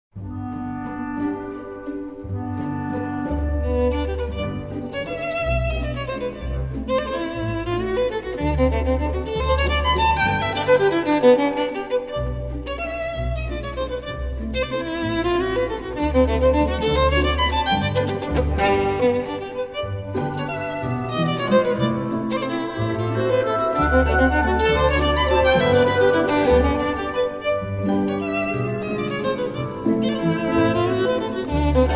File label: orchestra before